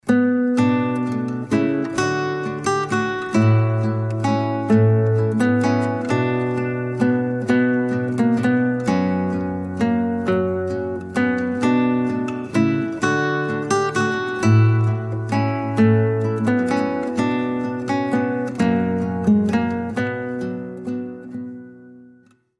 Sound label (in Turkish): santral fon muzikleri